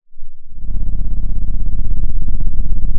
pop, Sound of an engine revving

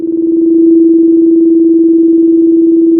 pop, Sound of a phone ringing

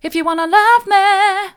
mid, vocal

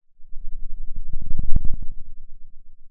Sound of a thunderstorm, center

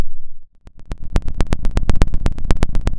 pop, Sound of a fire crackling

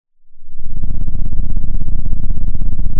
Sound of an engine revving

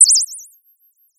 Sound of a bird tweeting nicely

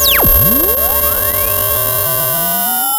sound of a bike bell

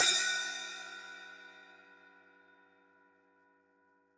Trash B, drum

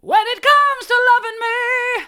hard, vocal

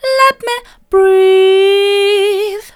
let me breathe mid, vocal

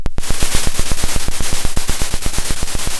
Sound of a drum roll

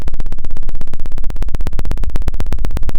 pop, sound of a small motorcycle

